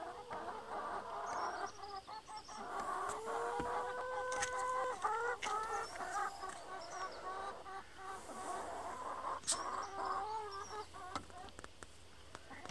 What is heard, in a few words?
chicken